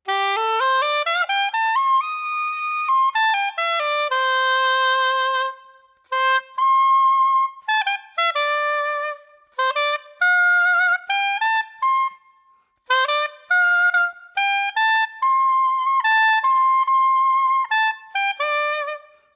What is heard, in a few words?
CLAROSAX; timbri